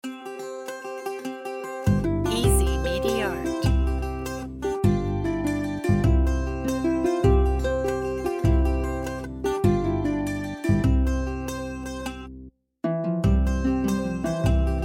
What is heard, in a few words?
lullaby mary had a little lamp